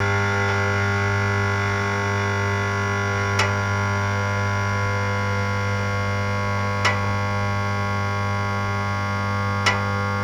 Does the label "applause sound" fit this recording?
No